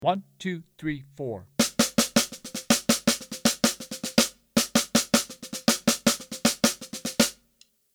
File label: Straigth 8ths Adaptive Overlay